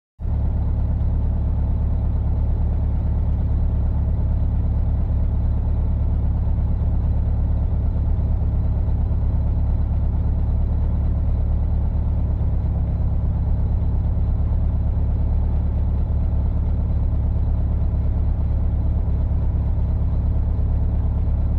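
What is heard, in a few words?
VW Bug interior idle & off; Cars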